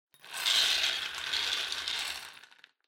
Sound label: Drawing Machine Garapon Spin